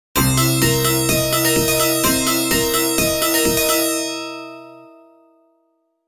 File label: Match Lost; U.S. Championship V'Ball; arcade